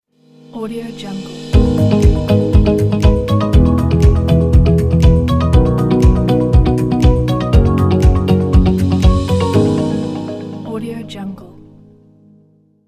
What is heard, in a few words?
corporate logo music